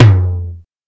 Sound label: TOM, Jungle; Gorge Sample Pack Vol.1; San Gabriel